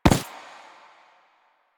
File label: Step Default, Foley, Footsteps; Enemies; Soldiers; Warframe; Lasrian; Butcher